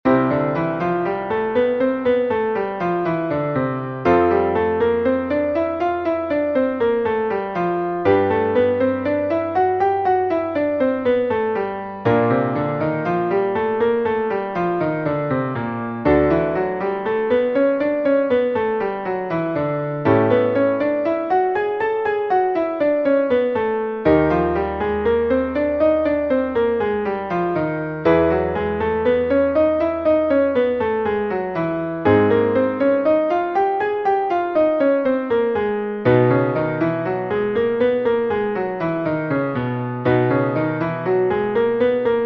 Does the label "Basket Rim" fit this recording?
No